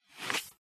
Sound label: Turn